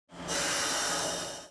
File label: Air, Train; OpenBVE; 103KCJ-LowerCab